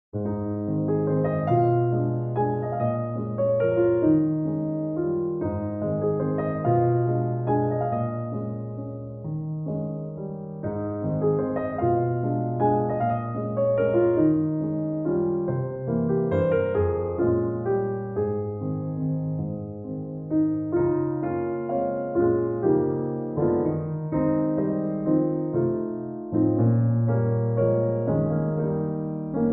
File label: Music Box Solo Piano